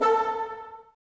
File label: Bassoons A 4; Miroslav Vitous - Woodwind Brass Ensemble; Bassoons Links